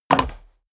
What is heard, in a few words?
step dress boots wood creak jump; schritte; menschen